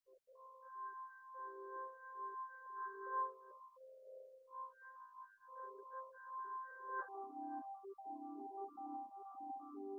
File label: nodrums synthconv; SiSEC08; SiSEC underdetermined